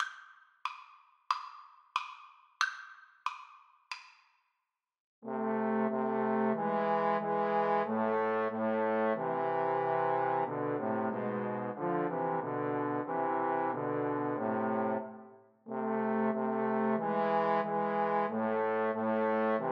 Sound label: go down moses, Trombone Trio